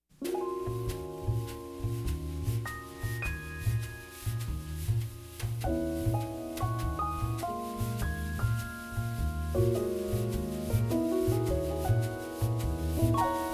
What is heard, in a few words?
Waltz